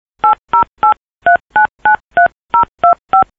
pipopapo

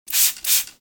kirifuki

